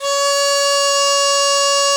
DIZI C#4, Winds; E-MU Producer Series Vol. 7 – Old World Instruments

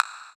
Wood, Block Stick; Equipped Music; Loungin House

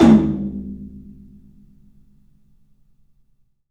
TOM, Low Toms, 3D Percussives

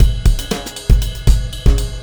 Beat, Drums, Eclectic Electronica

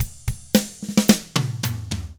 POP ROCK Drums; 109POP ROCK

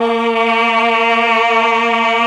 CHOR, Propeller Island Legendary M400

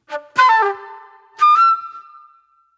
Soul Plate Flute

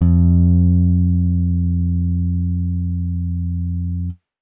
Dusty Analog Bass F, Bass Guitar; 99Sounds; Monosounds; Music Loops; Instrument Oneshots

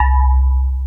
Electric Pianos; Wizoo Electric Pianos

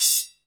SPLASH; CONSTRUCT 2

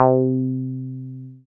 dance bass; Sound & Vision - Gigapack II CD 1

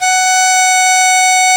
Didzi F#4; Planet Earth; Digital Sound Factory Planet Earth

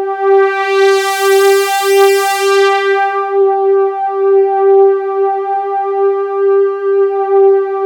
full analog; Sound Gallery - Charged

